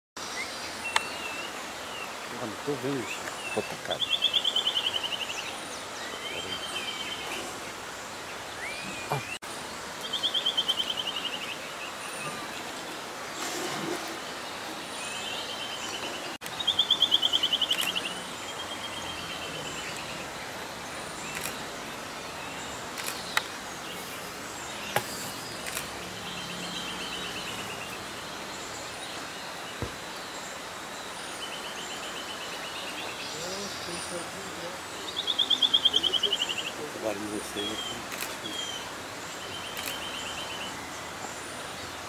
Canto do choquinha de asa ferrugem

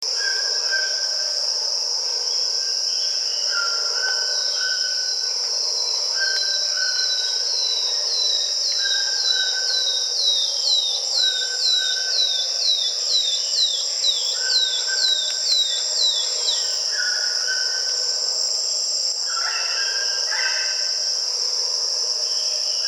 canto da choquinha de barriga ruiva

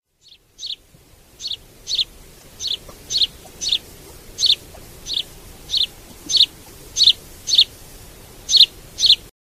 canto do pardal

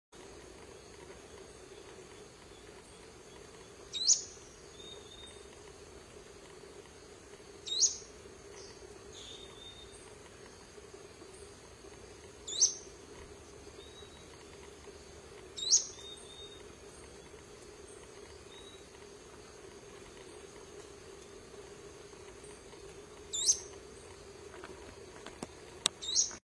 Canto do bico virado miudo

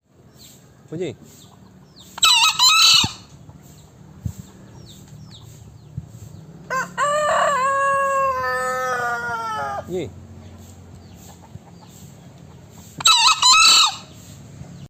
Canto do galo verde

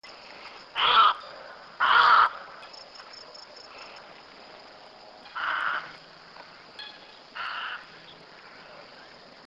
canto da garca branca pequena

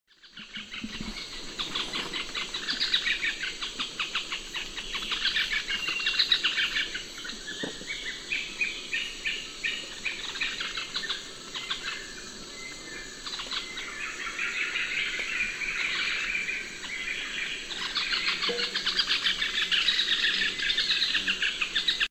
Canto do chora chuva de bico amarelo

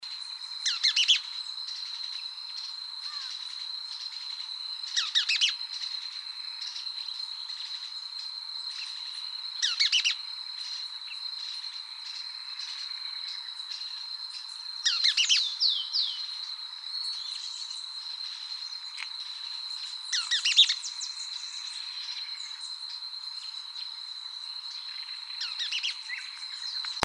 canto do bagageiro

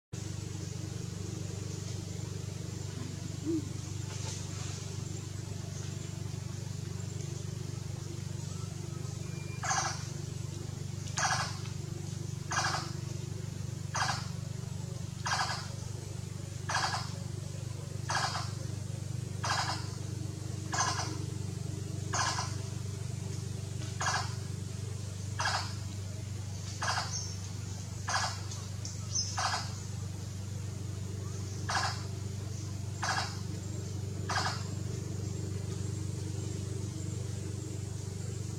canto da cucaburra de barriga ruiva

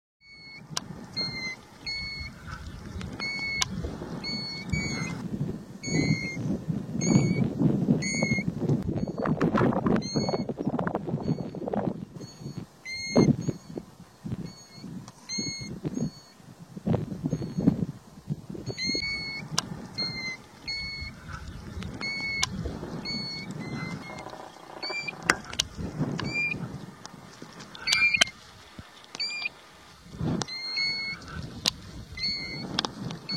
canto da tarambola dourada

